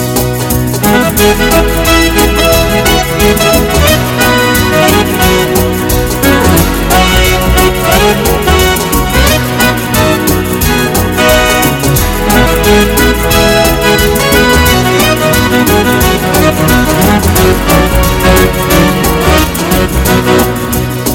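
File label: A Great Big Band Song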